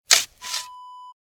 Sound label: shovel hit dirt